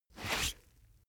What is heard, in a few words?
boxing gloves slip off fast; sport freizeit; boxen